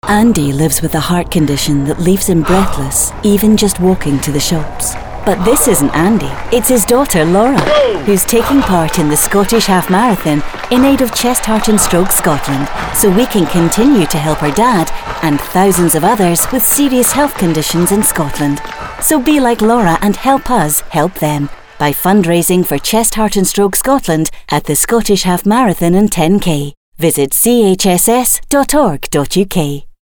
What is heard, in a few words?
breathing; Chest Heart And Stroke Scotland